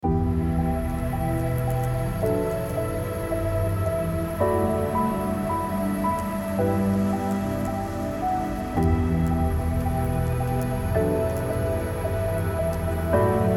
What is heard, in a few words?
trailer music